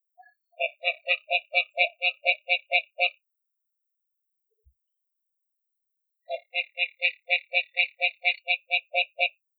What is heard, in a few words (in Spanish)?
Rana arboricola mexicana